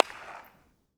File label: Reverb in front of abondend hospital; SFX; IRL Recorded; Reverb Enviroments